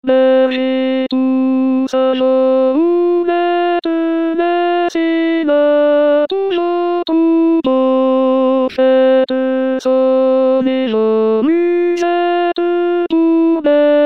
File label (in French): Ecoutez la voix des anges Alto, Chorale